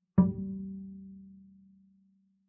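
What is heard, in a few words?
Bass pizzicato fortissimo sul D G2, Double Bass; MIS Pitches - 2014; Strings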